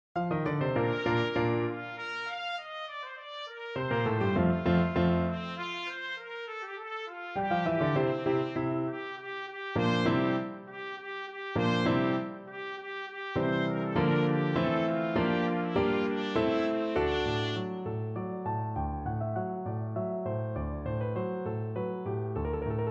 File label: trumpet piano; Sonatine3